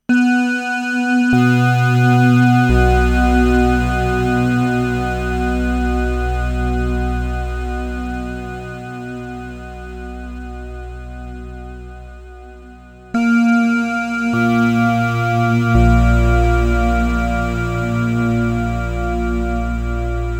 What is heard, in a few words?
ppg wave long notes